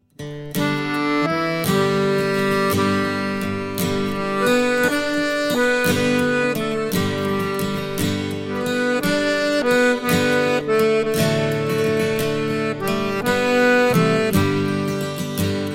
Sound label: A Chailin Alainn